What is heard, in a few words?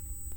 Hydrophone